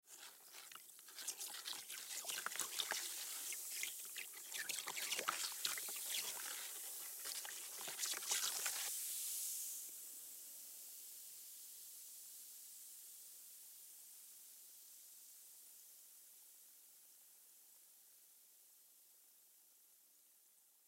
Vinegar Baking Soda, Vinegar Baking Soda Mix Stir Fizz; Fizzes; Foley; Blastwave